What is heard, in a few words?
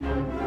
Music Dialogue Danger Start 64bpm